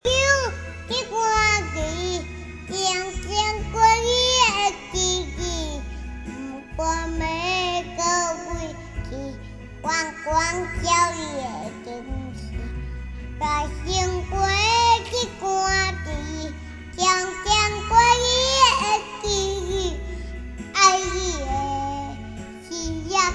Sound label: taiwanese song